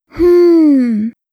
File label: hmm; Soundpack2